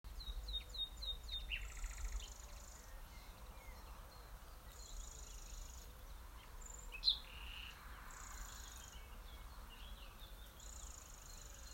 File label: Nightingale